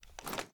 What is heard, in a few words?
ar reload gl rotate; weapons; gmod; viper; scharlie